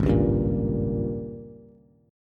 Ab Major 7th Suspended 2nd Suspended 4th Ab, down, Bass; strummed; JGuitar